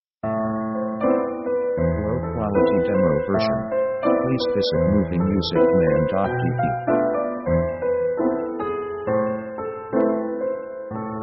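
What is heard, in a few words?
orchestra romantic piano; melancholic; melancholic themes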